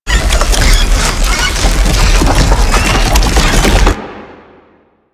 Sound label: ape prelate escaping loop; EndlessCapture